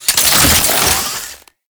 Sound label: Zipline Start; Enemies; Gameplay; Warframe; Lasrian Soldiers